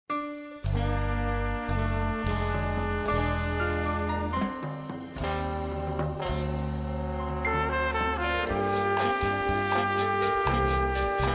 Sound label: vocals